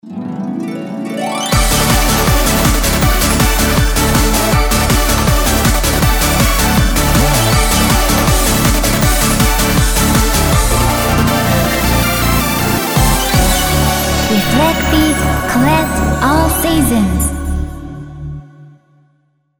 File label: bgm opening; reflec; colette